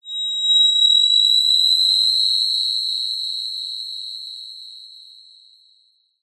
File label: sound design whining ringing high pitched ascending tone eerie